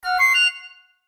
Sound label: Chime Harmony; Chimes; Multimedia; Blastwave